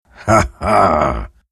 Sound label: laugh; Juggernaut